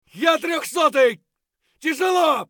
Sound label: bear low hp; eft